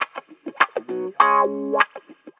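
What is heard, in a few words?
guitar, Roots Funk; USB Soundscan vol.41; AKAI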